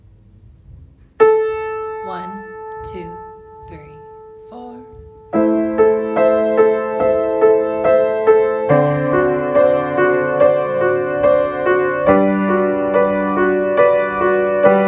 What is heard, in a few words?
audition accompaniment